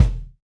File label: Jazz Kick; Roland BK-5